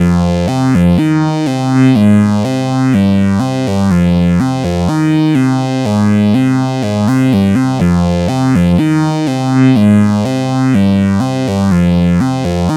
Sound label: Synth, Trip-Hop Loops; Sonic Foundry (Sony Creative Software); Rondo Brothers Trip-Hop Loops & Samples